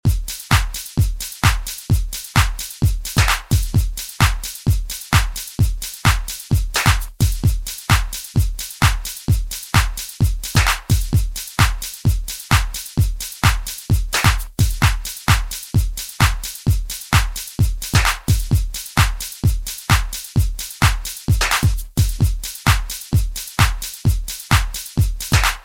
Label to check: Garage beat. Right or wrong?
Right